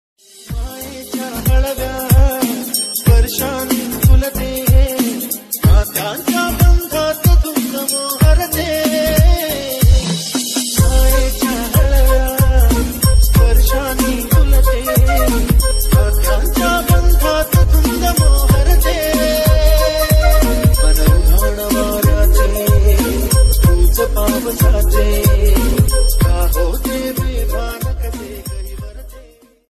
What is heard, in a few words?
marathi ringtone